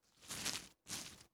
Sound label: Step On Leaves